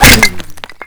bullet impact crate; weapons; Modern Warfare

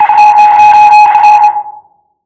ambient battle; World Of Warships

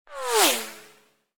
bullet passby

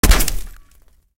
bullet hit outside

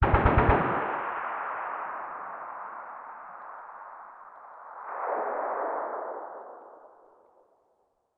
combat atmosphere; World of Tanks

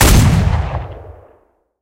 cannon 37mm flak36 shot

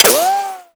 shield small

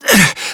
breathing hurt; Call of Duty Modern Warfare

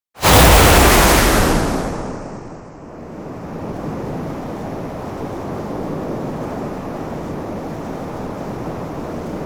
Aircrafts Ejection Seat Wind; DCS

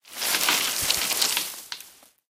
tree twist, tanks

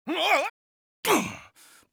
stumble

